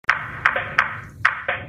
school ringtone

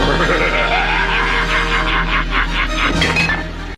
laughed

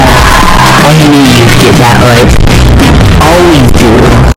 earrape

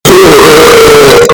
errape burp

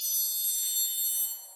triangle roll

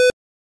tone

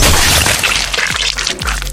piercing sound

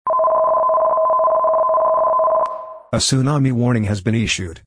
tsunami warning; jquake

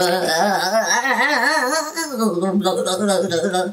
brain fart

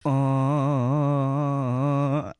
arab awww

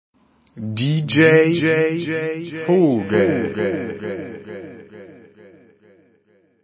echo

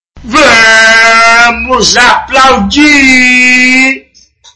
vaaaaaaaaaaaaaa

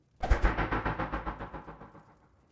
alert sound

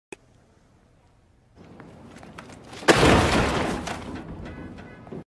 man throwing computer

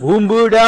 aaaa

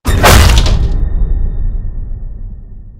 fear attack

